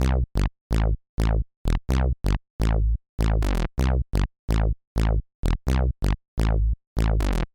synth bass noch satter

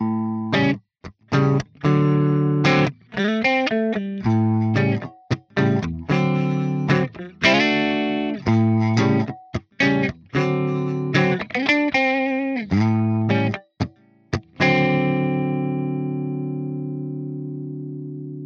california style; two notes torpedo pi-101 testbericht

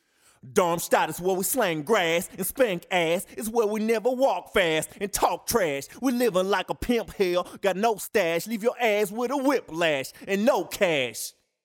rap vocal

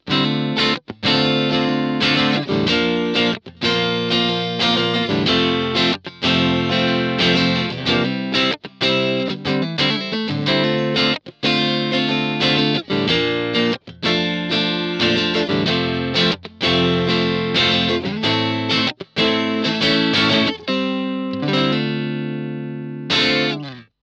vox valvenergy mystic edge

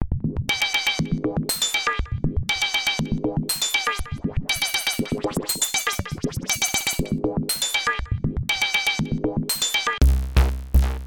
percussive; pioneer toraiz as-1

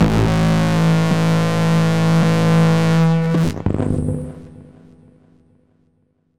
bass; sidsonic tubes

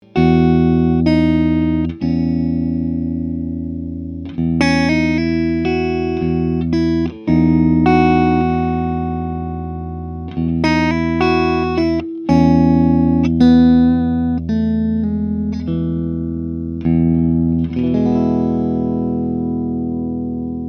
Mesa Boogie Road King 2x12 clean

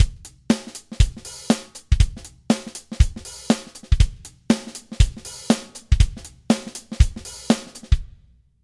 drums verb; exponential audio phoenix

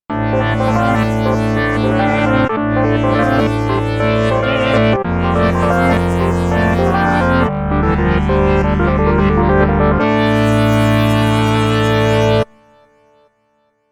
nord lead a1 fifth sound

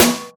Snare

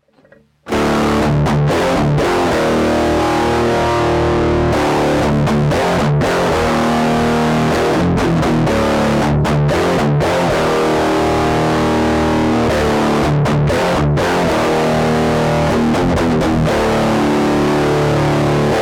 blackstar; subdecay

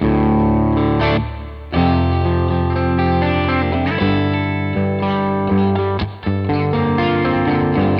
antelope audio edge note blank

